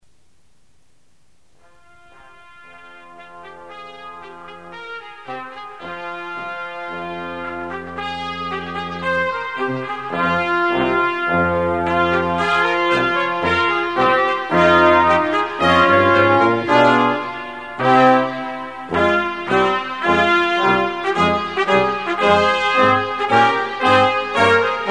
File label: holiday brass